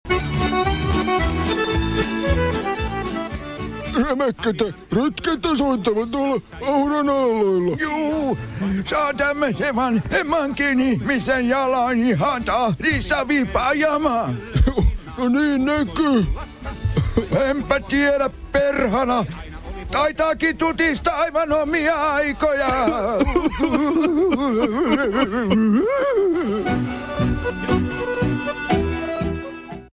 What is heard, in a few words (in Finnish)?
jingle; vaarit; Radio Auran Aallot